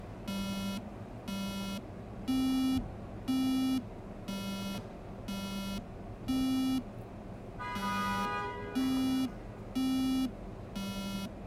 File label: Speaker test